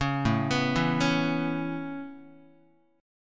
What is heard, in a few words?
A-Major Add 9th Guitar, Aadd9 chord; Aadd9 arpeggiated; JGuitar